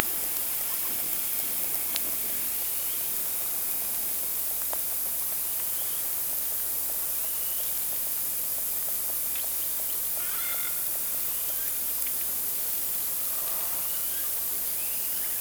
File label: Orca sound Night Passby